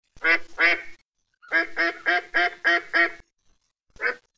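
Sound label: quack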